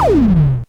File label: Laser start snd Laser start; Awesome Planes